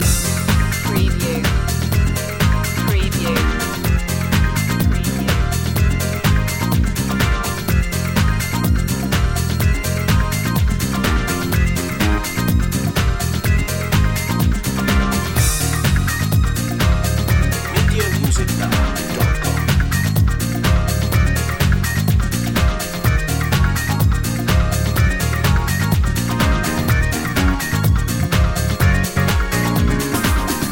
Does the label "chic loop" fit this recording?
Yes